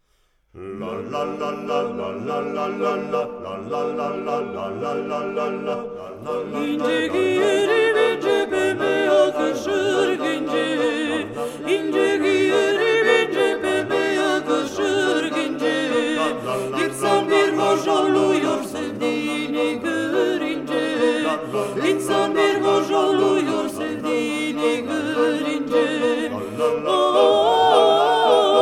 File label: acappella; Ince